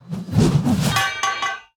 Mace Large Death Underlay

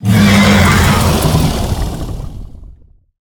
Death

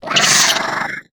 Attack

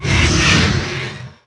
Hippogryph Attack Vox Underlay

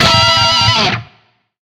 Attack

